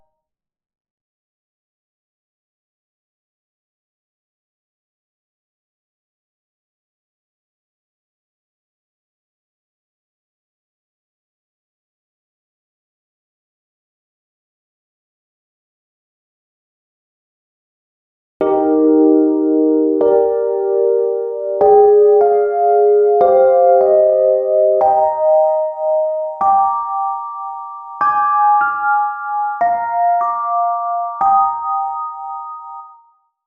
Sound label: epiano